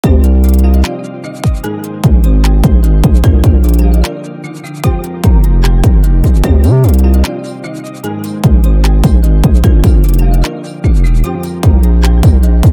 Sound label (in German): beat mit drill 808 auf 150 bpm; 808 Producing Guide